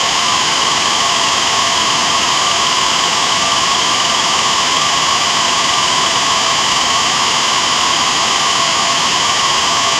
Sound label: machine wheel sound random; natural